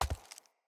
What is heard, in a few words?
Walk Default, Footsteps; Characters; Enemy; Mockeries; Mockery